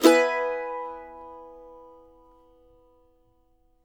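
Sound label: MANDO MAJ E major down, Right; Sampleheads; Whole Lotta Country 1 & 2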